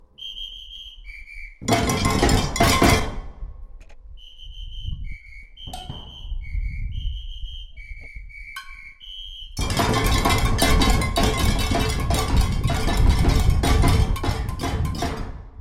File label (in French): percussions break